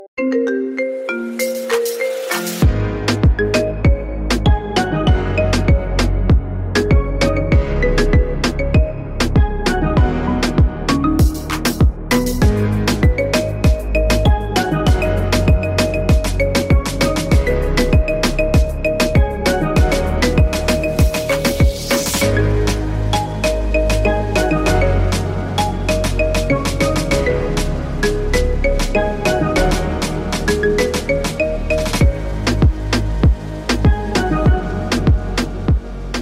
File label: Beautiful Apple iPhone Ringtone; ringtones